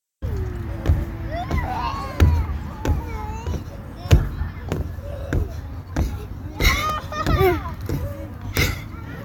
Trampoline

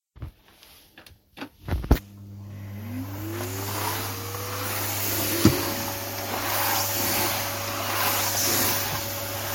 Vacuuming

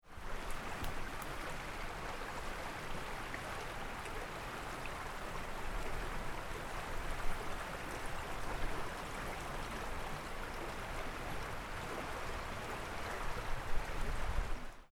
flowing water

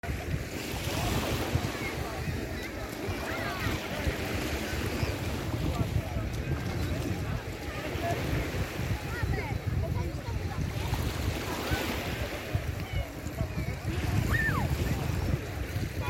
Sea waves

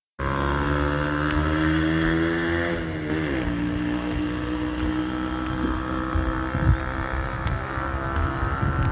Motorbike accelerating

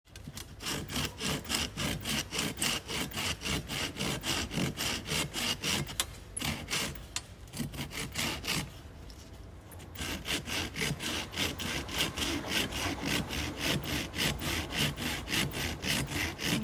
Saw

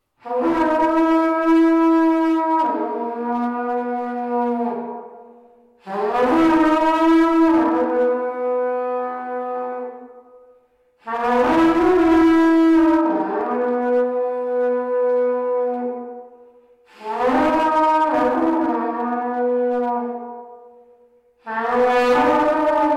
Carnyx

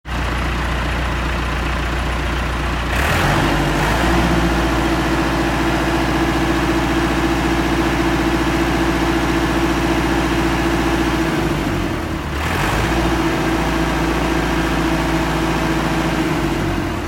Tractor

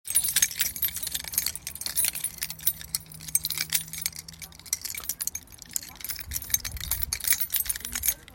Scout scarf

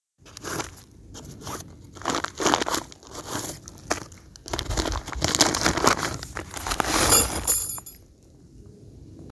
Tent pegs, in nature

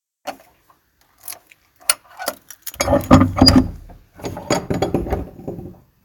Opening the garden shed

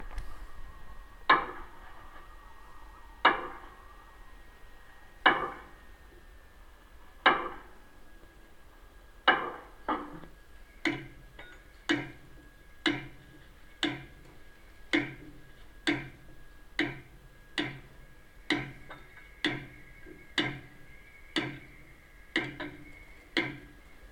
Caulking

